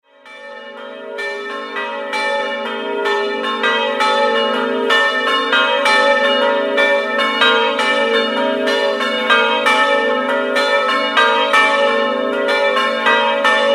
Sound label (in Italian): AVILLA, campane